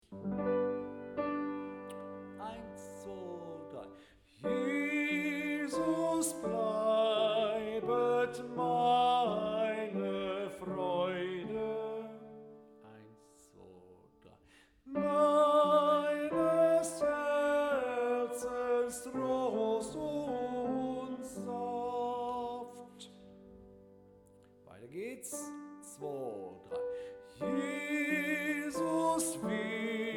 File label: tenor; Jesus bleibet meine Freude